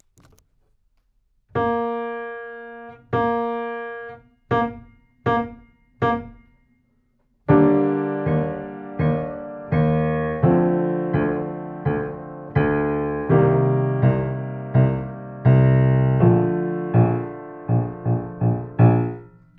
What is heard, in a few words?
Bb 4 bars, sax